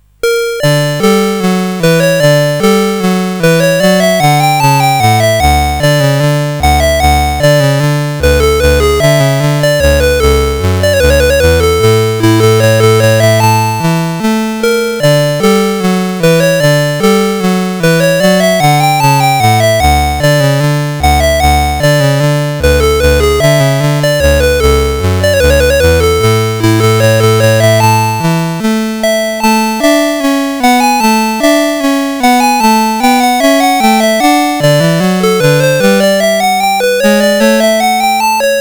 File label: March in D, CSG8580R5